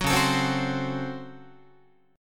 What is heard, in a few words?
Db Major 7th Suspended 4th Sharp 5th Db Guitar; 9,9,12,10,10,11; DbM7sus4#5 strummed; JGuitar